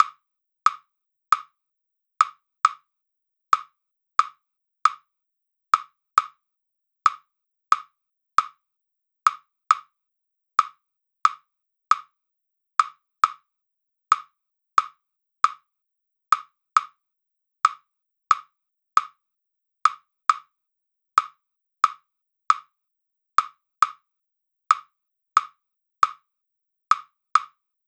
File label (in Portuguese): arrocha 68 block